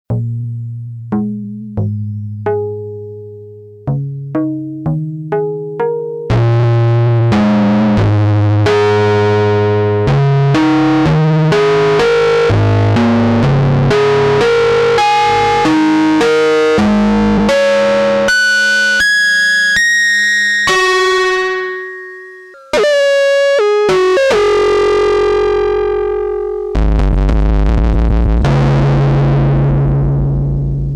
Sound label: dx100; BOUMWARMING UNIT; otomachines; BOUM DEMO